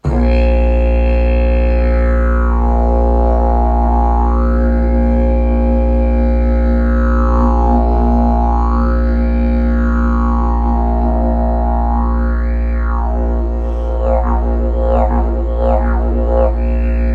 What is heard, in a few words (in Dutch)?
Didgeridoo geluid